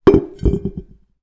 teapot down on wood table with slight wobble, household